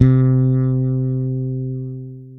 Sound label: FRET BUZ C, PEDULLA BASS; Masterbits CLIMAX 7 - Bass